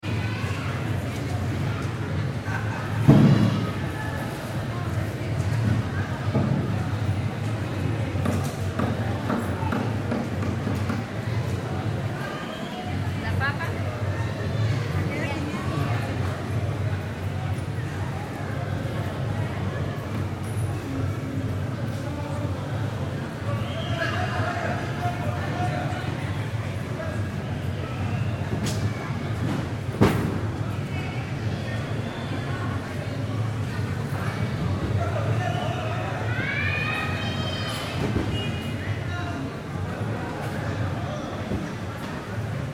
Crowd Market Costa Rica Busy Activity; Stores and Malls

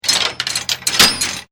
Chain Metal Clanging

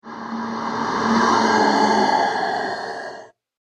Ghostly Halloween Monster; Ghost Sound Effects

